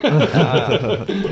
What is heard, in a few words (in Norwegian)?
sjefen latter